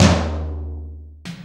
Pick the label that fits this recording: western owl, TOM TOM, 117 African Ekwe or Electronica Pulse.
TOM TOM